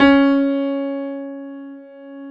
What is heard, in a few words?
PIA PRO, Roland; Sound & Vision; Gigapack I CD 2; PIA GRAND 1; PIA Grand 3